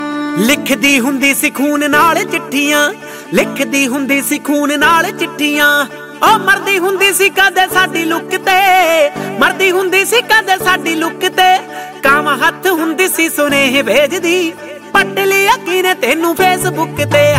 punjabi song

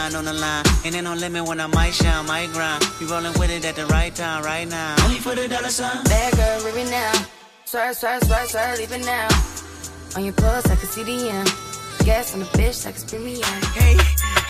new hip hop

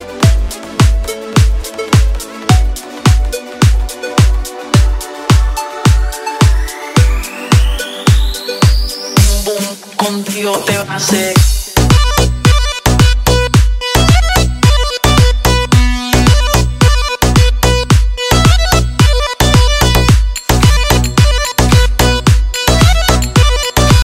turkish sad

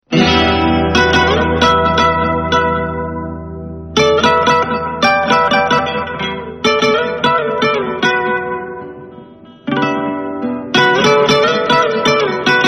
love instrumental

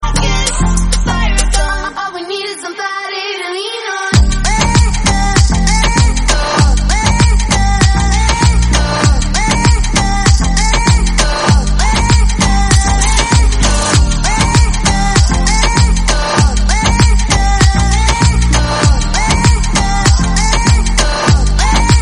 electro

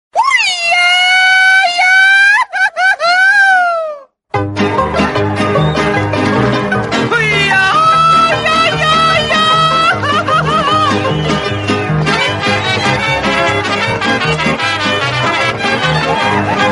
mexican polka

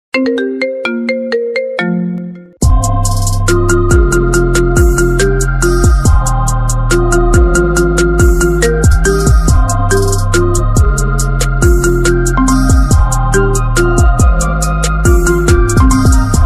marimba iphone; iphone 2020

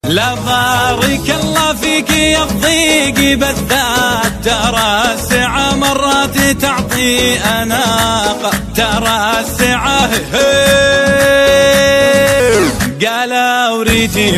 arabic

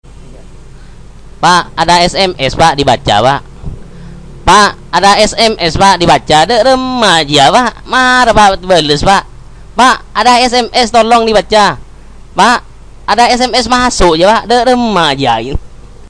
indonesian sms emotional

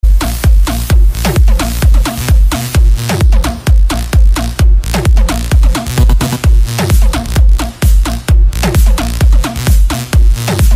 trance electro

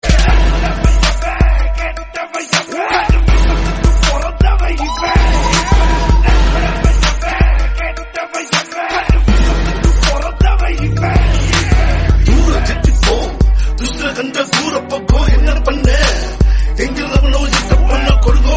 gangster bass

